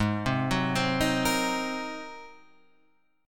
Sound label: G sharp 9th G sharp; G#9 Chord {4 3 4 5 4 6} arpeggiated